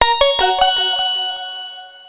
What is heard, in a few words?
School Pleasant